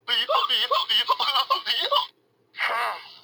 donkey laugh; donkey from ttath

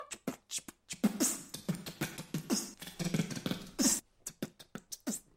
beat; pewds

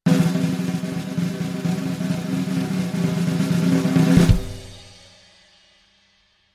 drumroll and cymbal

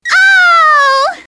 ohhh; amy rose

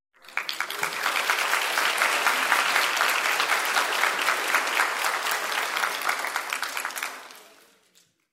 audience clapping

